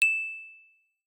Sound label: Twinkle, phonetones notifications; Optimus L3 II E430 Movistar